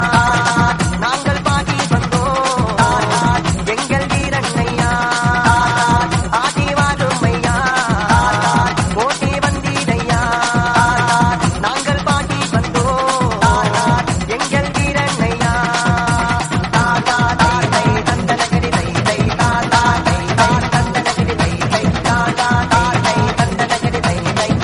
tha thai remix

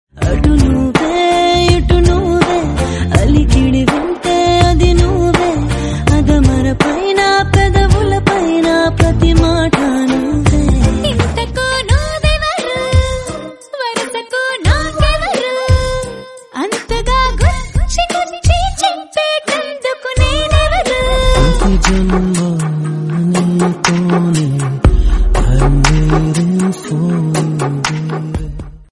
love mix telugu

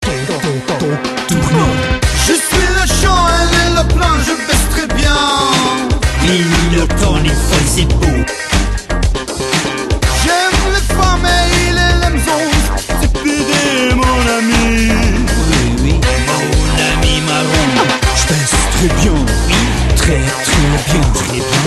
dj groove french; ringtone

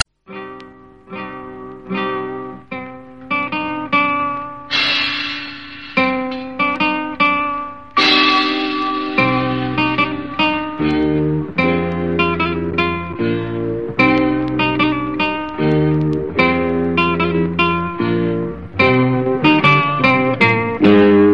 guitar

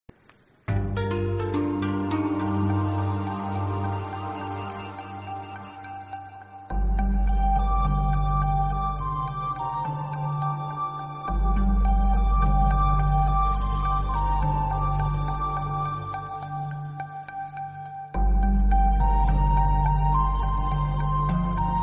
bgm; bheema

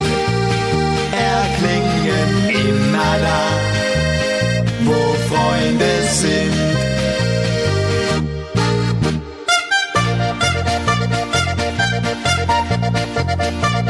german ringtone

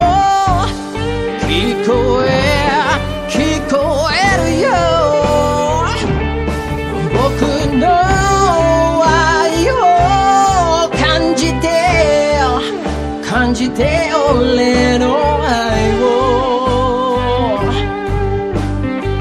japanese ringtone

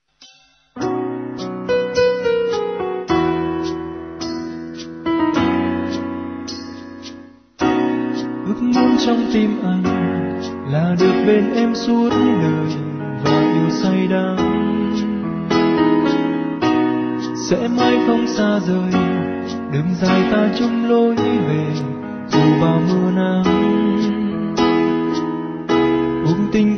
vietnamese love ringtone

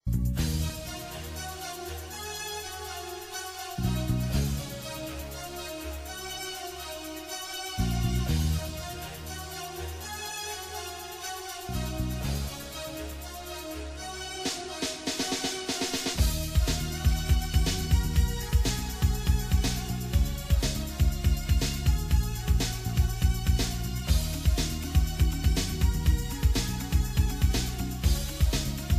latin freestyle ringtone

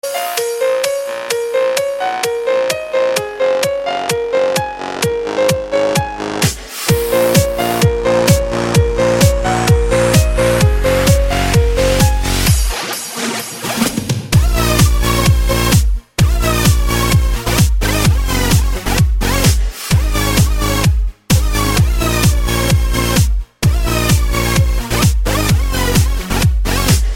new russian dance

